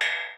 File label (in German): metallklang; klang im schnee